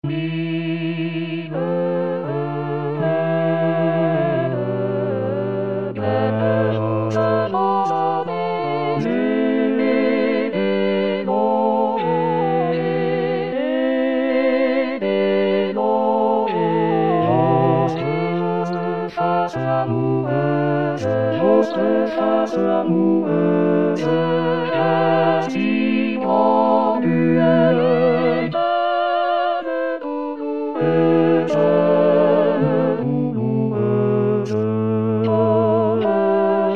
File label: Tutti; Mille regretz